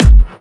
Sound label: Clubby Kicks, Bassdrums